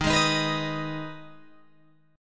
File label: Eb Suspended 4th Sharp 5th, Guitar, Open G